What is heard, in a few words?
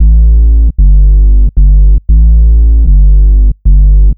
Bassline; Drums & Drones